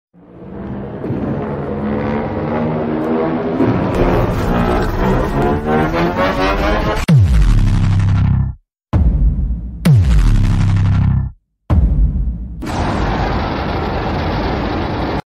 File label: burning godzilla atomic breath sound effect